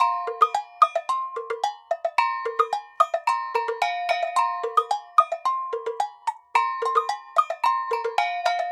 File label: Bell Ensemble, African; Sonic Foundry (Sony Creative Software); Continental Drift Aftershocks